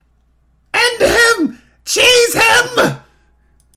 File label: rocket launcher